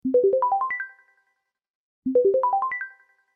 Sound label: Bubble - LG, tonos de llamada